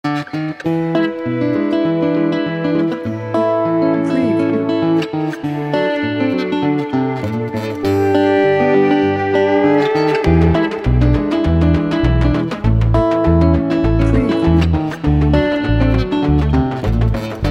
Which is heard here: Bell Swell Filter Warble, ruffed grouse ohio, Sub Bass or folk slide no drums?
folk slide no drums